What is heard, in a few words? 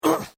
Pain Umph, Male Painful Grunt, Hurt